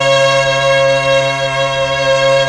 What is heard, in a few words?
STRING; ILIO; Propeller Island Legendary M400